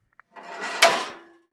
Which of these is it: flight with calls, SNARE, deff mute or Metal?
Metal